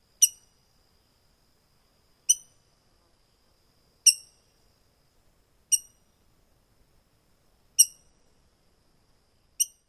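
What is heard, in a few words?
ROBRGR chink calls, rose-breasted grosbeak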